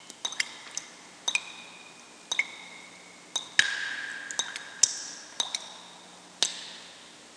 drips; bigcity

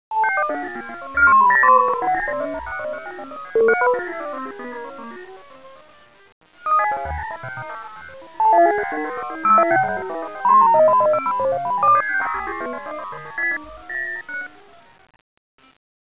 computers

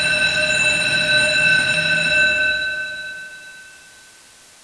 security alarm; cs greatassault

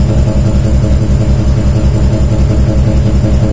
rattle machine

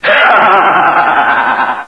"haha"

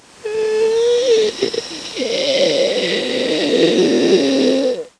zombie idle; mystic radar; mr zombie1

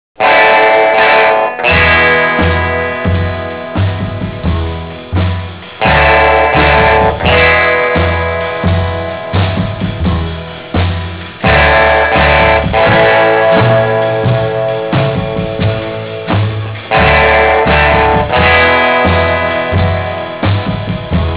rumble

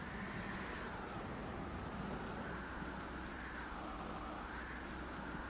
desert wind

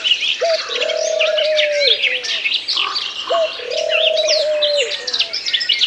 bird; zm ded street3

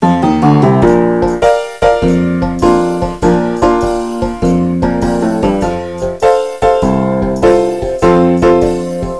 saloon piano

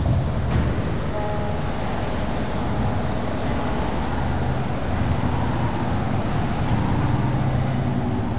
atmos

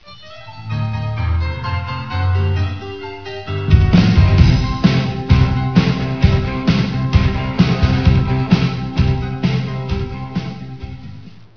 rock; shogun

